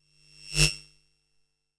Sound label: Multiple lamps and cables flowing energy whooshes